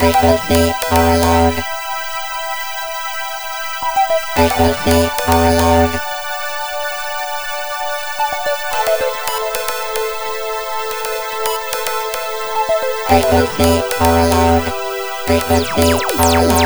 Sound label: 70s analog synth